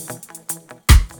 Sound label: DRUMLOOP, Ragga & Dub Reggae; AKAI; USB Soundscan vol.27; DRUMLOOPS